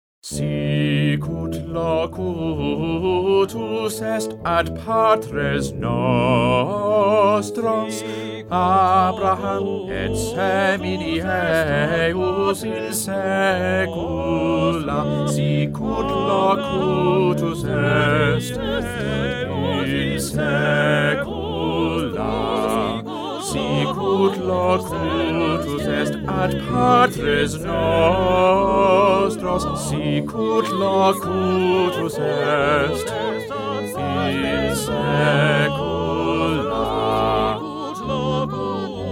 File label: Magnificat bass; bach; magnificat 4